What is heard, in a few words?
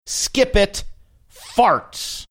farts